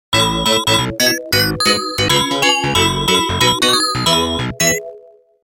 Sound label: normal goal in